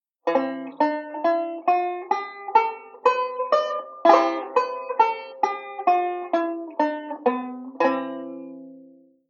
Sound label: Scale Enclosure, banjo; Beyond Chord Melody Volume II